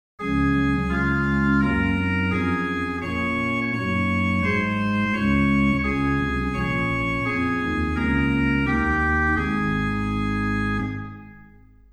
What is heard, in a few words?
Rohr 8 + Oct 4 + Cornett, Ged 8, Sub 16 + Oct 8, organ stops; Silbermann middle-sized church organ; St. Anne; Hw (solo): Rohr Fleute 8', Spiz Fleute 4, Cornett III Hin: Gedacktes 8' Ped: Subbass 16', Octavenbass 8'; Reinhardtsgrimma